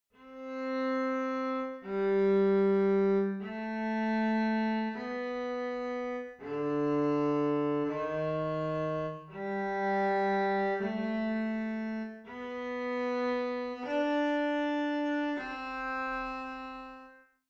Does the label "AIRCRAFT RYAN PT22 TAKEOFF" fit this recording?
No